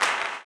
CLAP, blues & soul; ILIO; Vocal Planet

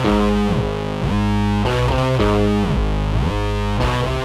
Bass FX

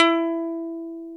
E-3 PICK, bass; AKAI S-Series CD-ROM Sound Library VOL-8

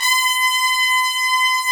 BRS TPTS, Brass Sections; Roland LCDP06 Brass Sections; Tpts Sec.1

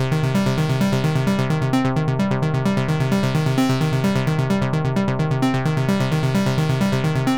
130 Cm synth, Synth Loops, Trance

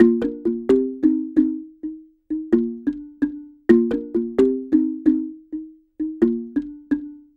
Marimba 130bpm C, African; Quazibeat; African Secret

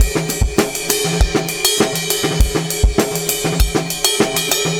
Live Beats, R&B Drums

